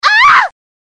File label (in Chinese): 女惨叫